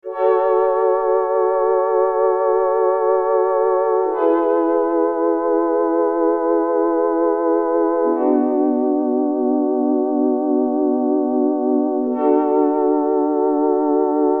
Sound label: Moog MF Chorus Stereo Chorus